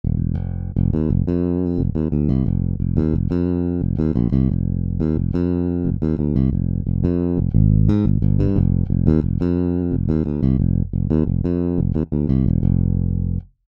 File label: Electric Bass